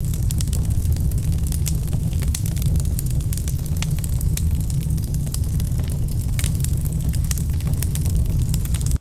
close up wood fire crackling

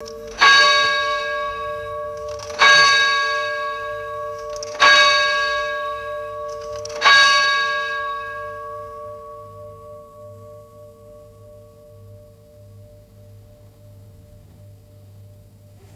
large clock chiming half hour

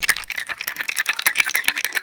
rapid shaking of a whipped cream can

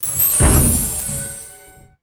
bright magical ding and low thump

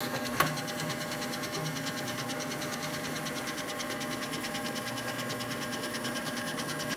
mechanical office scanner operating; machines

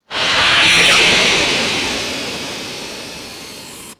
short pressurized gas purge blast and hiss; aircraft

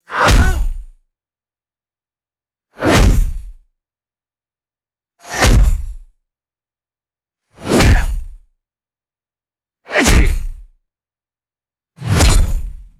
five stylized retro anime punches

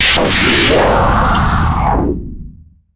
sci fi force field energy hit; designed

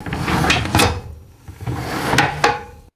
fast metal drawer opening and closing

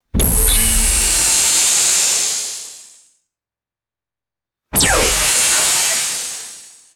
powerful sci fi laser beam shot; lasers

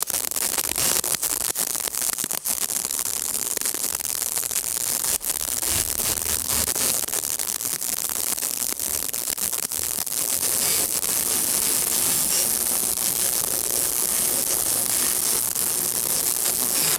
wet splatty electrical sparks crackling; electricity

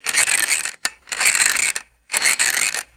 winding a small metallic toy; toys

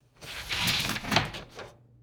sharp paper towel pull from dispenser

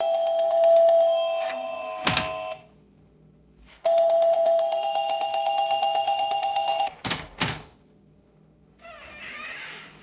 mechanical doorbell chimes with electrical buzz; bells

